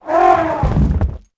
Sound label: War Mammoth Death Sound; Heroes3